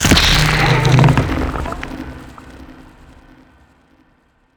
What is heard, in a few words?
projectile; Jove